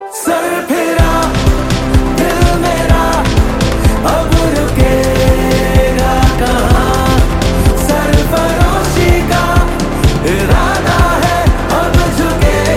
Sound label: Song Ringtone